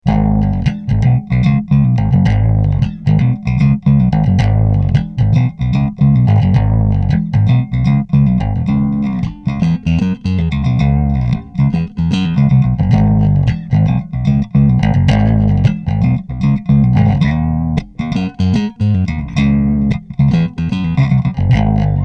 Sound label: riff